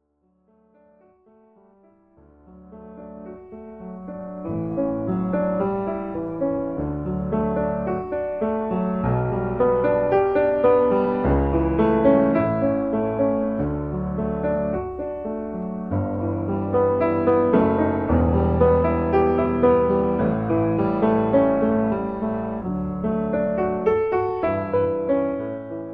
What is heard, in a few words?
LOW VOICE, piano; mozart